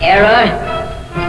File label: error